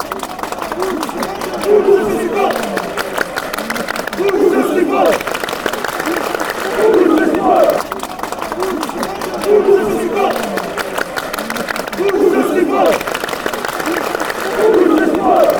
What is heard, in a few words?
clap for bursaspor, fanchants